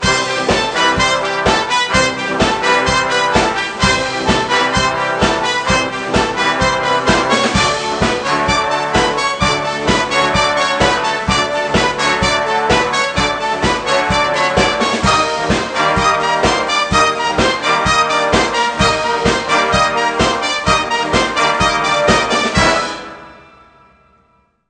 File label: Menu Song; NCAA March Madness 98; PS1